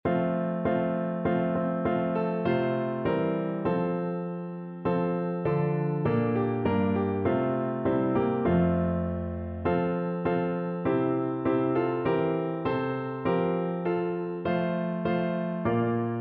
take my life piano

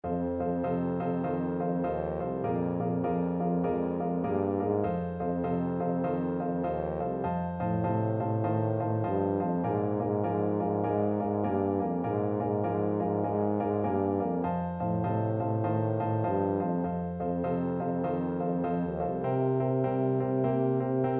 style, tuba

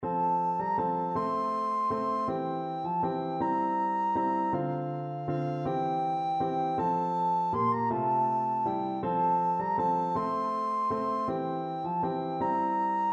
recorder

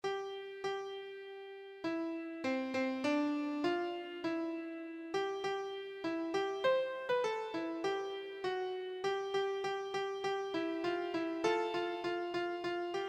lord bless you, keyboard

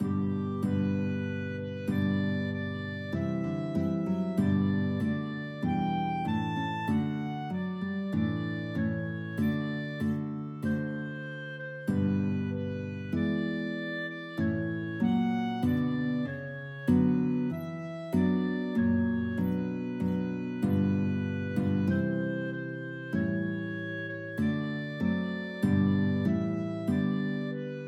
guitar clarinet duet; navy hymn

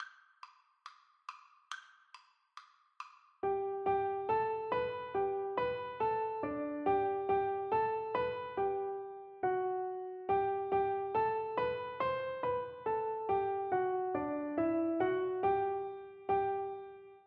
Piano Duet

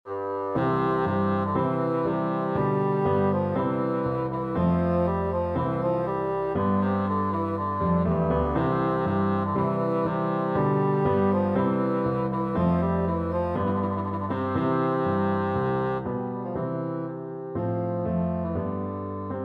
te deum, bassoon

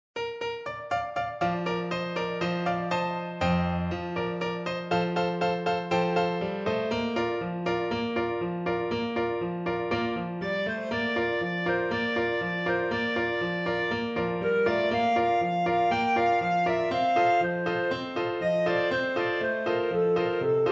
la adelita, clarinet

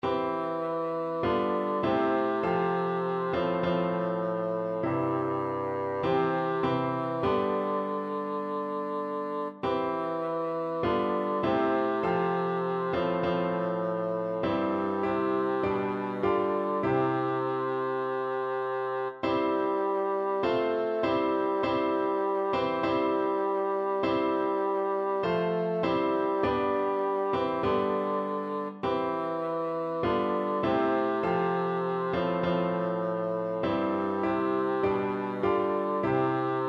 nearer my god, bassoon

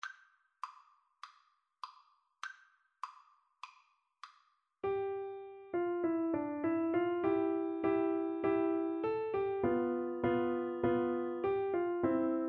Piano Duet

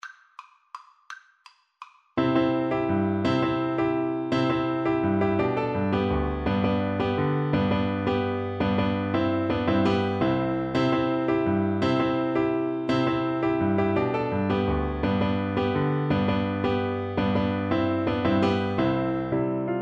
horn, French Horn

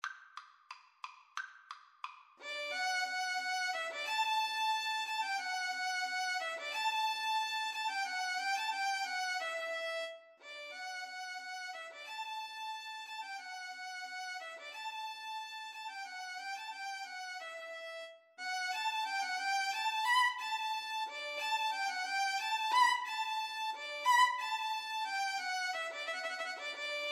Violin Bass Duet; vivaldi spring

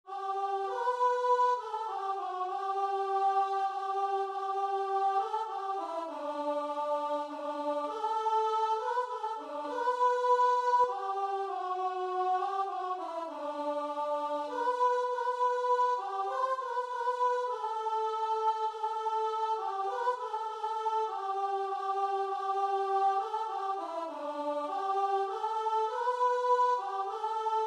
i need thee, guitar and vocal

